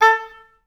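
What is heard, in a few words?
oboes staccato A3, orchestra; Best Service; Peter Siedlaczek's Advanced Orchestra; OBS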